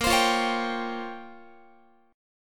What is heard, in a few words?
Bb Minor 6th Add 9th Bb down Guitar; Bbm6add9 strummed